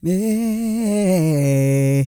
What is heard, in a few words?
GOSPEL